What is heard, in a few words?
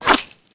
bullet hit, Gore